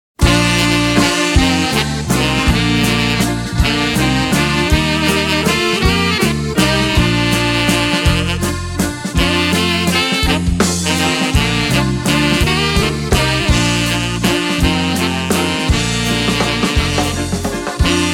Waltz

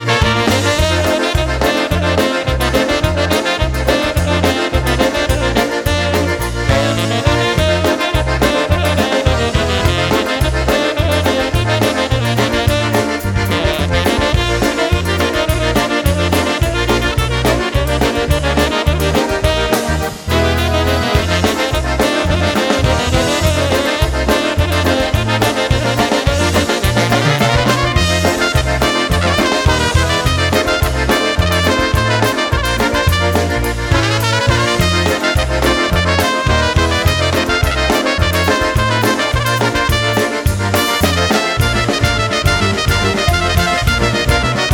Polka Medley

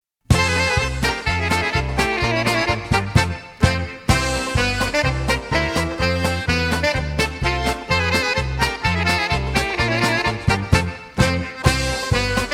Paint Brush Polka

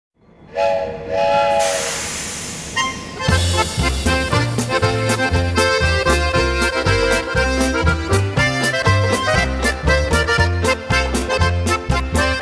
Whistle Stop Polka

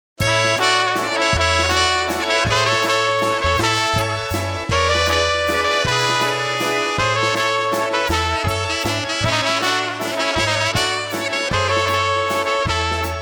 Waltz

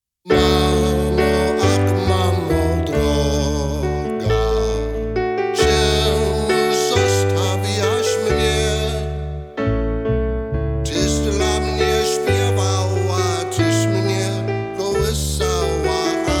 Waltz Medley

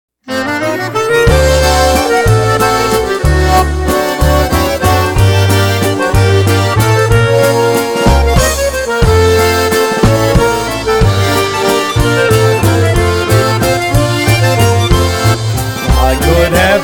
Waltz

